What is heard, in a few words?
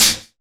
HIHAT; E-Lab; X Static Goldmine 2